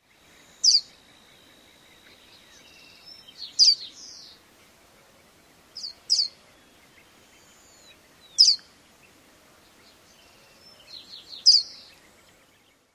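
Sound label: calls, lark sparrow; TX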